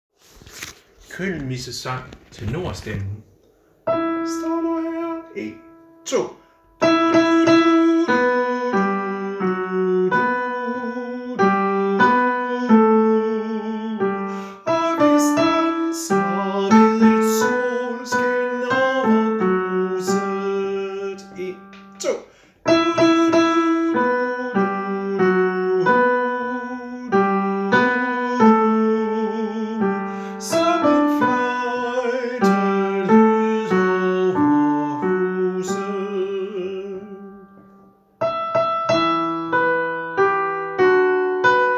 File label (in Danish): tenor; Hein Kyndelmissesang